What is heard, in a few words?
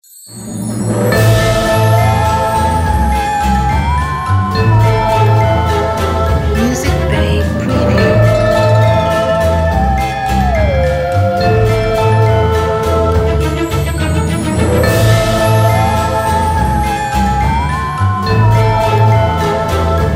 Halloween Eve